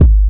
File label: loud kick drum one shot g sharp key